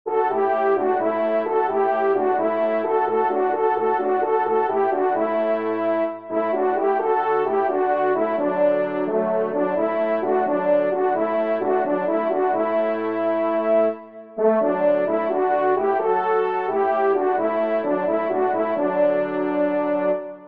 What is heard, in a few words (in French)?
TRIO, trompe cors; Le Faux Revu